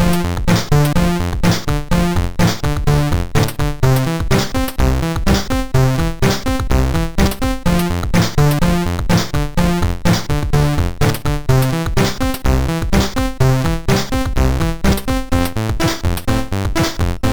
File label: sid MOS6581R3; Drinking Battery Juice; DEMOS